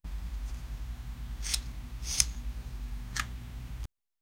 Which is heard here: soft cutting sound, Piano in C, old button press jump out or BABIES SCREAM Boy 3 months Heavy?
soft cutting sound